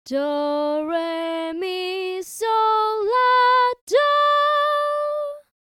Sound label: solfa scale; Solfa Scale for Melody 2